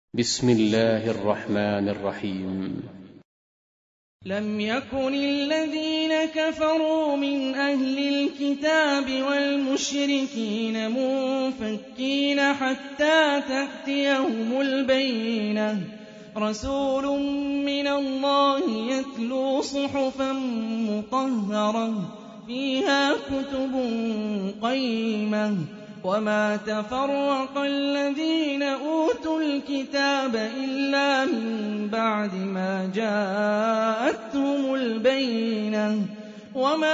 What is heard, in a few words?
Quran; Hani Refaey